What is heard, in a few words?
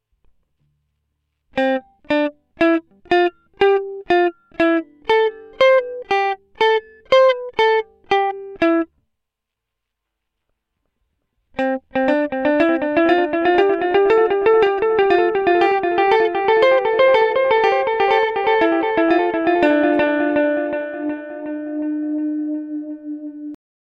Dotted Delay